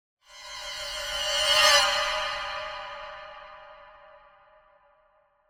Symphonic Hit Run Across - Increasing Violins Chord; Hollywood Edge; sound designer's toolkit v2